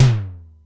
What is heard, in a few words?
SDS9 MT, Simmons SDS-9; SDS9 Factory A4